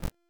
Edge Lift; Warframe; Pacifist

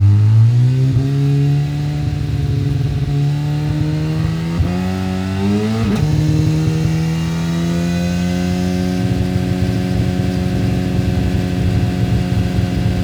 Engine Rev Up, Motorcycle; Warframe; Vehicles; Motorcycle Ninety Nine

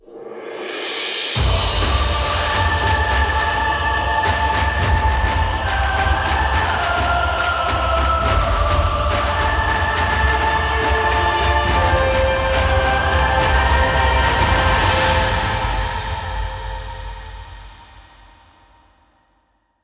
Mastery Rank Complete Cue; Warframe; Cues

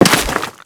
Crewman Step Dirt, Footsteps; Warframe; Corpus

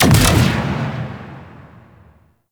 Arachnoid Wraith Web Fire; Warframe; Corpus; Abilities

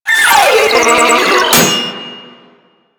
Proto Infested Volatile Runner Explosion Warning; Warframe

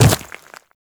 Quadruped Medium Land Default, Footsteps; Warframe; Infested

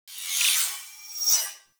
Rail Jack Tech One Off

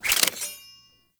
Throwing Dagger Reload

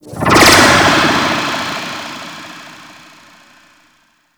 Drone Vaccum Ability; Warframe; Corpus

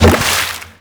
Aqua Board Land; Warframe; Yareli

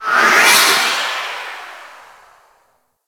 Drone Vacuum Pickup; Warframe; Corpus

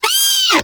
Snow Critter Vocal Pain; Warframe; Hunting Critters

